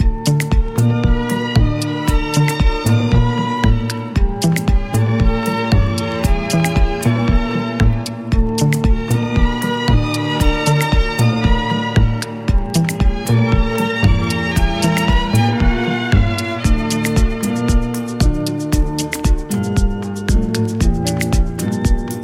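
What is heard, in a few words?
Violin Acid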